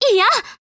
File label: combo break; osu